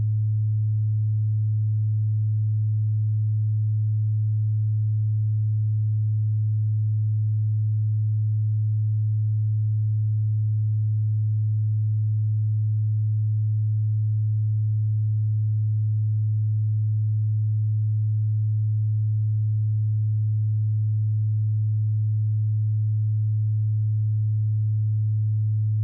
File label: 20dBFs, 100Hz; BAARIA